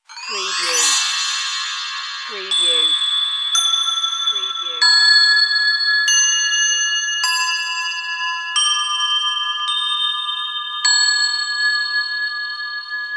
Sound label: Bells Chinese Bell Tree Gliss